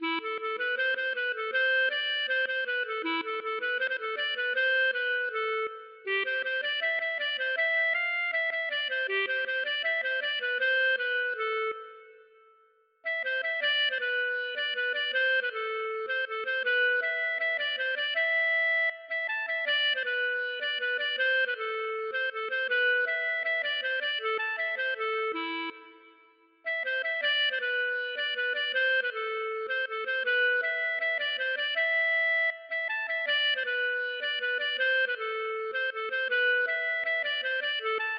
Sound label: klezmer